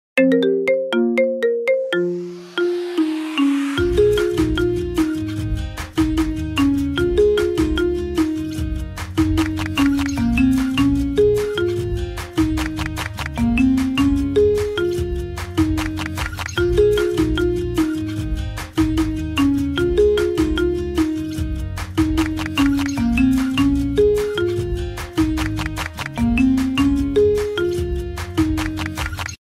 marimba remix

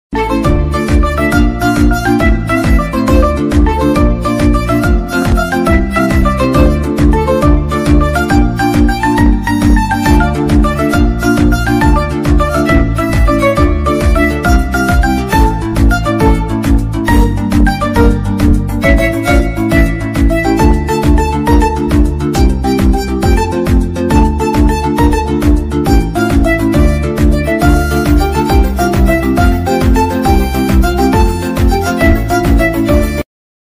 Marimba Remix; Jack Sparrow

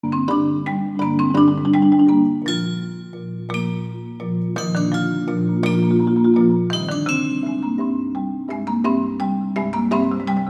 marimba; Game Of Thrones

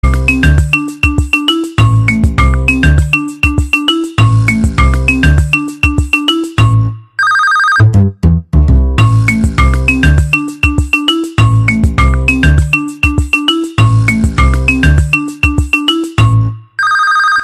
Samsung Galaxy Note 8

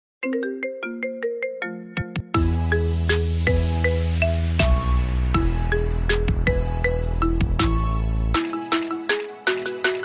ice cream marimba

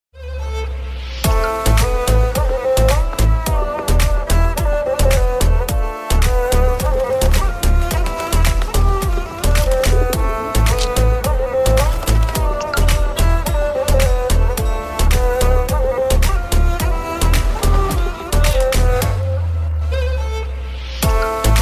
Huawei Remix, sonnerie

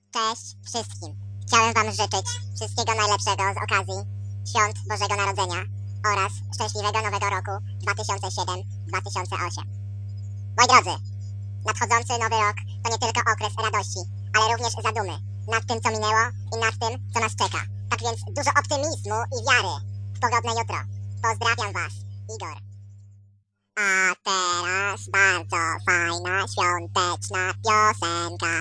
christmas greetings

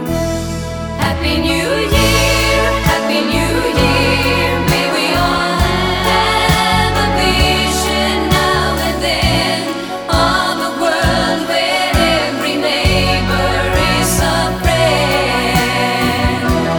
happy new year

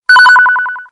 sms chat

